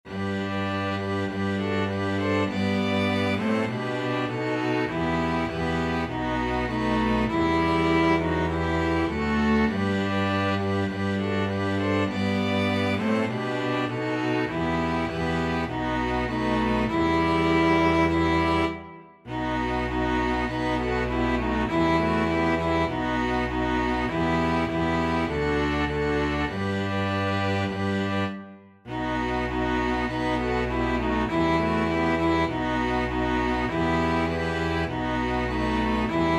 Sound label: ukraine national, string ensemble